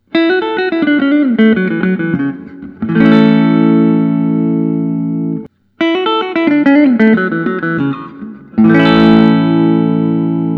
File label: gibson historic 59 les paul